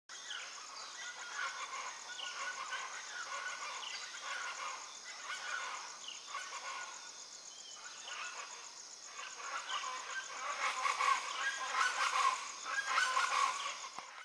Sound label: Plain Chachalaca Sound Calakmul